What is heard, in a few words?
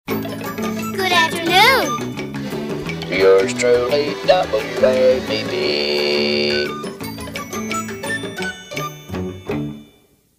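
Short Signature Good Morning Sonovox; WABB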